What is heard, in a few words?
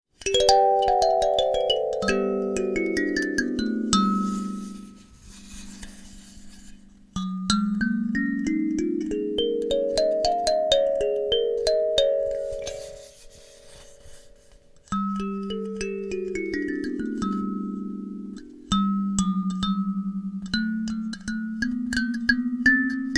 Chromatic Celeste Alto, kalimba